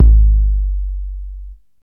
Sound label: puffy kickdrum sound g key